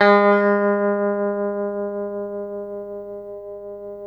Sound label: TELE TREM A 3A, Guitars; Spectrasonics; Hans Zimmer Guitars VOL-1; TREMO-LUXURY